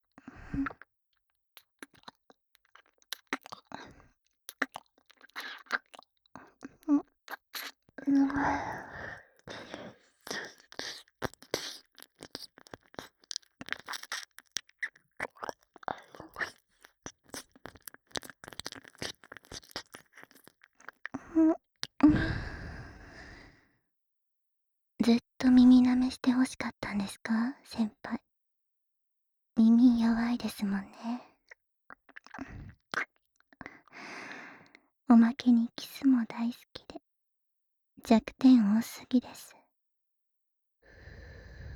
耳舐め, ASMR

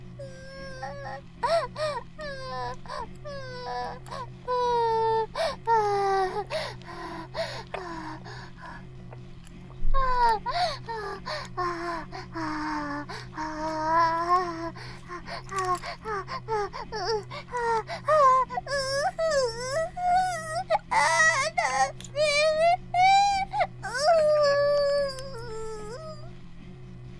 バイブ放置; イクたびにわけて

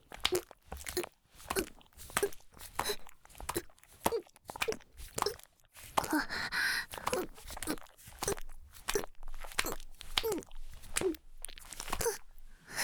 ギシアンループ; 田舎の別の無感情少女と自己犠牲愛; おまけ (第4、5話のギシアンループバージョン)

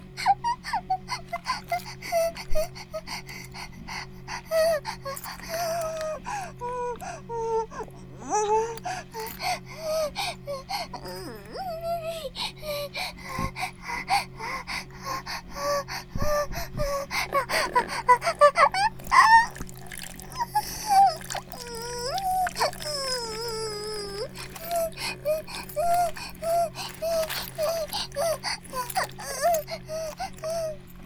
バイブ放置 おしっこ; イクたびにわけて

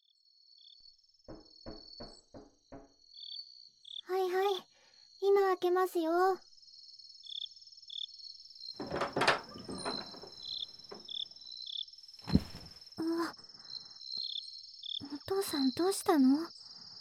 ぐっすり眠れる耳舐めボイス; ASMR 癒やし

